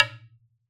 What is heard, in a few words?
Wooden Bar Hard Strike